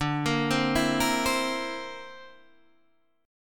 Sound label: D 7th Suspended 2nd Sharp 5th D, Guitar Open D